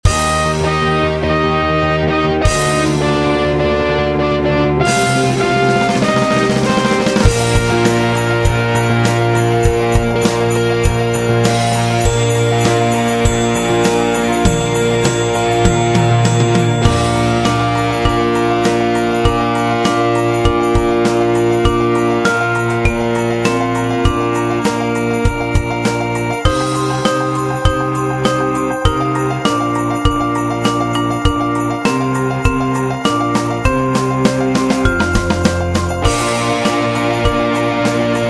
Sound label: Jingle Bells B Flat